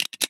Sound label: m3k switch, weapons